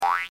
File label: Toon